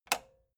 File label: metal button spring push